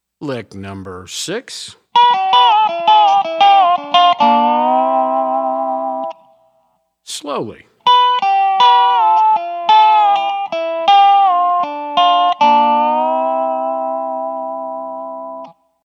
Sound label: resonator pedal, steel guitar